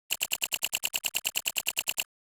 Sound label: text classic, CYBERSPHERE; Phil Michalski